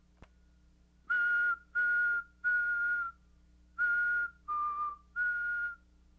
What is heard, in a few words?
dry whistle